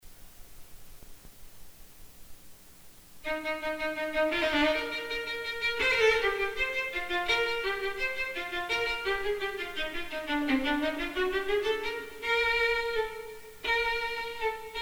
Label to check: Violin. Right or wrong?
Right